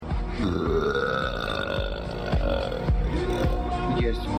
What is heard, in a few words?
burp